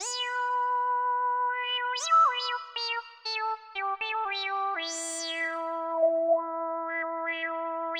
120 Dance Synth Lead Emin; Dance DJ; Pro Tools Essential Loops; Digidesign

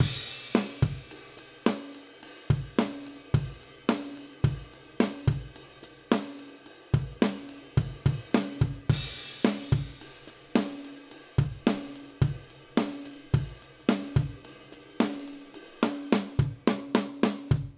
Country Drums Outro; Digidesign; Pro Tools; Pro Tools Essential Demos; Essential Loop Demos; Demo Session - Country

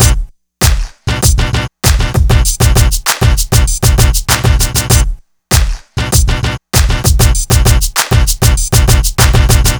E Coast HipHop Drums

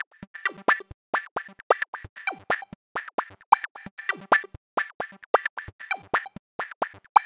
132 House Arp Gmaj; Dance DJ; Synth; Arps; Pro Tools Essential Loops; Digidesign

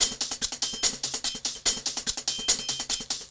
145 Dance Hats Perc; Dance DJ; Drums; Pro Tools Essential Loops; Digidesign

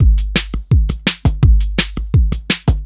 Dance Beat; Pro Tools Essential Loops; Dance DJ; Beats

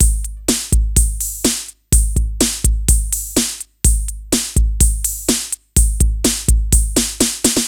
125 Neo-Wave Drum, Fast Tempo; Pop Rock; Drums; Digidesign; Pro Tools; Pro Tools Essential Loops

